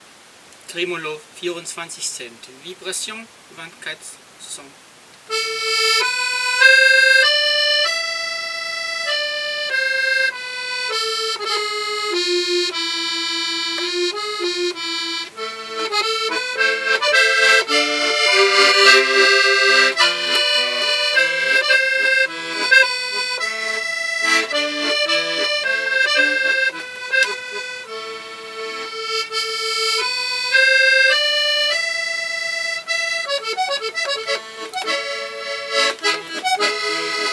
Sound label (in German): tremolo vibration 24 cent, akkordeon